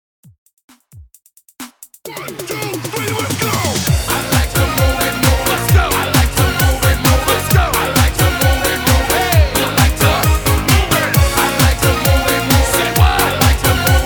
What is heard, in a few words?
80S DANCEHALL HYPE 132 BPM